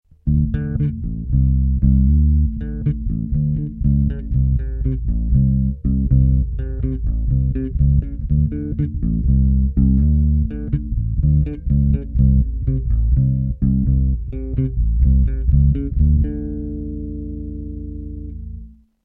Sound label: Boogie, Basse